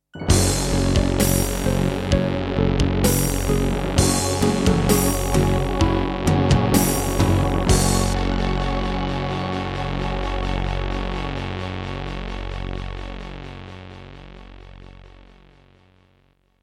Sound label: Terratec WaveSystem SCW001-2; Doom (Sigil); Introduction